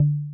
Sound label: slider bar; Luxariah Default Minimal